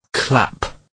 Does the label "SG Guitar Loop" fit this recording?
No